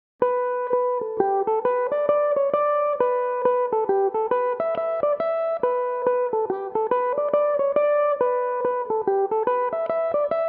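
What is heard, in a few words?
E dorian, irish mandolin, tunes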